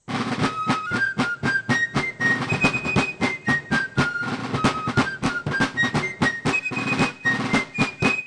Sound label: Fidle